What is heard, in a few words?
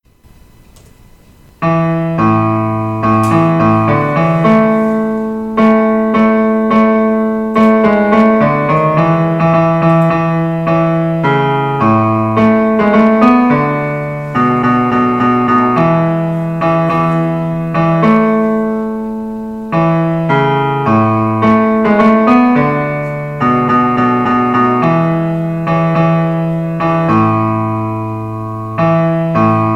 piano basse